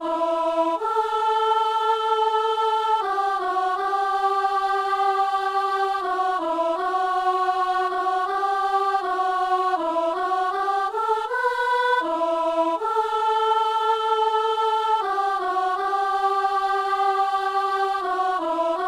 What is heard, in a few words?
alto